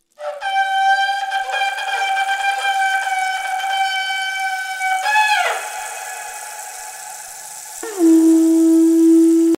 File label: Intro Shofar